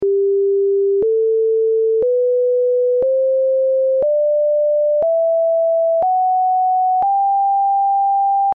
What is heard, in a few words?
Zarlin 396 Hz